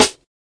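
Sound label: classic hip hop snare; STYLE KITS; Classic Hip Hop Mega Kit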